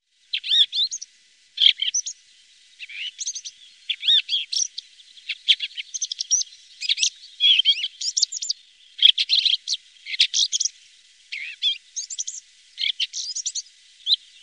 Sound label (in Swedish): Tornskata, Faglar